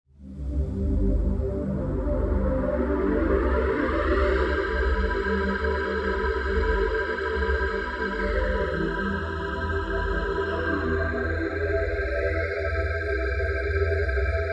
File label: Razor 5th Bass